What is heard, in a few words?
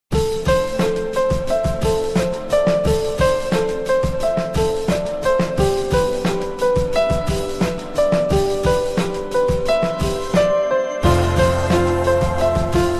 Wonders, ringtone; Sony Ericsson